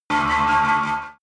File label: extrinsic creak; energie